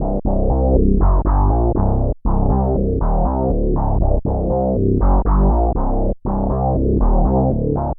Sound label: BowWow Bass; Dr. Fink's Funk Factory